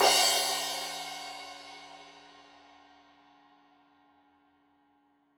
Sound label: Crash 15in L edge, Cymbals Stereo